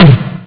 snare; OctaMED SoundStudio V1

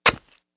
cat get hit; Immortal

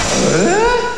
ARUGH; L'Effet Pommier - Volume 04; Télévision; Soap

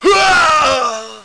panic; Messiah

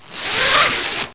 zipper

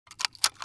springfield reload fill, weapons foley; Spearhead Demo; MacFormat 2003 June

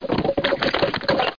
Chimp Drops Stuff

